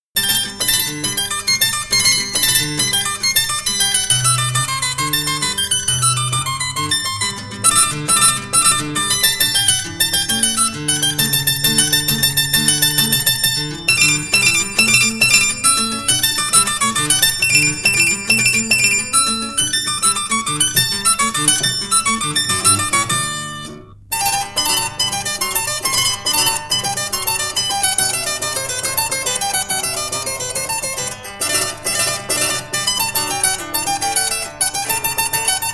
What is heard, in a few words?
Harpsichord Sonata in G major; Kk. 153; Domenico Scarlatti